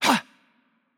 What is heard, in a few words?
Attack Light; bloom minigame